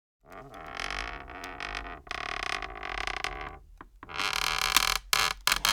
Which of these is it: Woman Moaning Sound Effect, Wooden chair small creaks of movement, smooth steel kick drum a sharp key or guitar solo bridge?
Wooden chair small creaks of movement